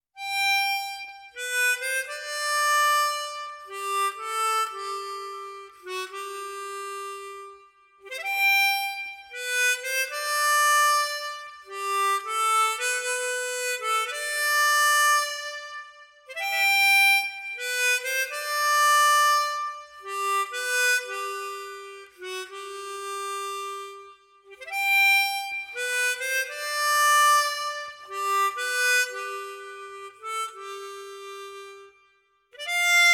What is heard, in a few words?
Harmonica